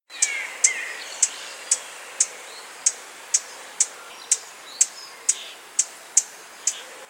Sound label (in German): Klappergrasmücke; Ruf